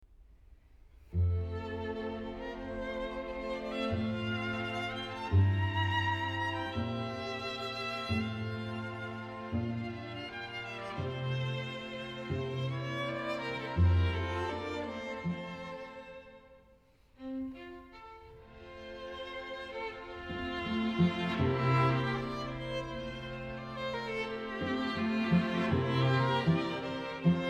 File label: String Quintet in C Major, Adagio molto espressivo; Op. 29; Ludwig van Beethoven; Beethoven - String Quintets Op. 29 & 104